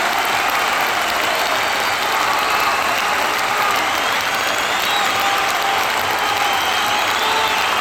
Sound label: BBall Crowd Cheer Loop; SFX Kit; Crowds